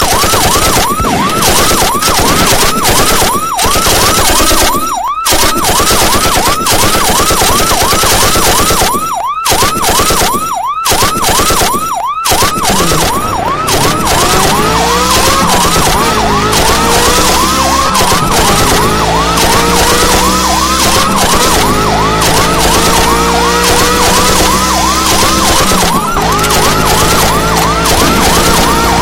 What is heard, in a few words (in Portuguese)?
policia atirando